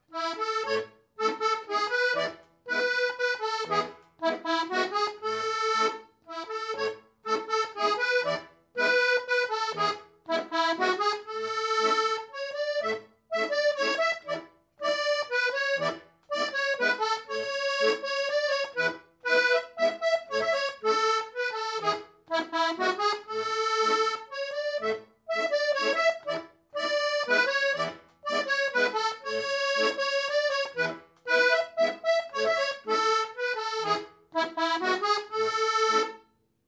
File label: Mazurka